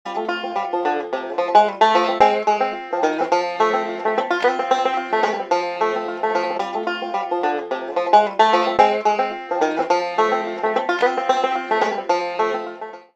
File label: bluegrass banjo sound effect ringtone